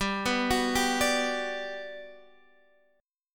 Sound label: G Major 7th, G, down, Guitar, Standard; arpeggiated; JGuitar